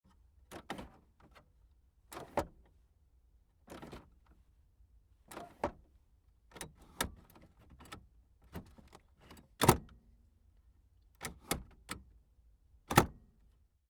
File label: car vent slider